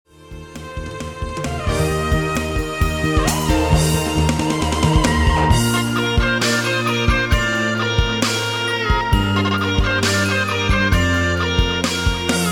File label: guitar melody; 1812